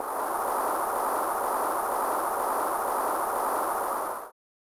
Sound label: ATMOSPHERE SYNTH Cm